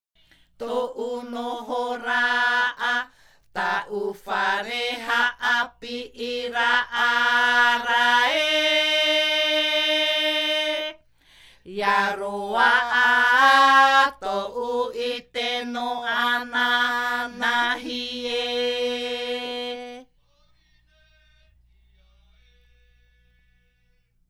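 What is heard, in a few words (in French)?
Himene ruau Reo piti, chants polyphoniques maohi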